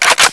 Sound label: STG44 bolt pull; dod